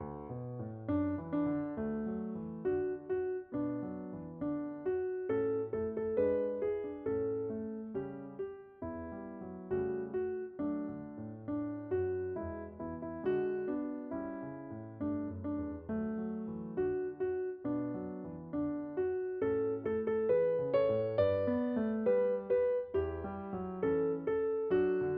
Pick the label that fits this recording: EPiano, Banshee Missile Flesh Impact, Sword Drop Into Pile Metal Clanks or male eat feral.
EPiano